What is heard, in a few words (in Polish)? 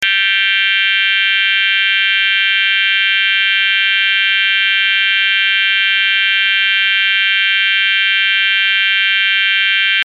ton